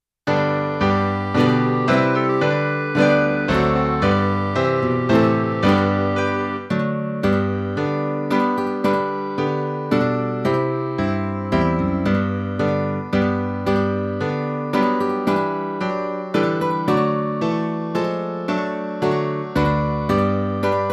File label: midi